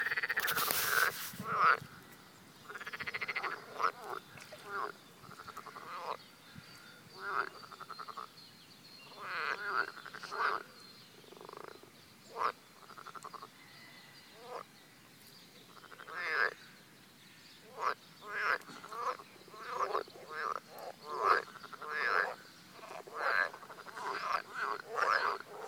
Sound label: Frogs, Leipzig Germany